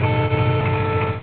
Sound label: Diesel Horn; Railroad Tycoon